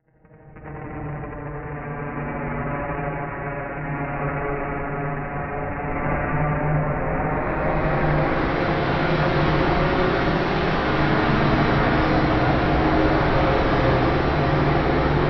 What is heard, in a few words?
Slow Drone Tension; Adobe; Production Element; Imaging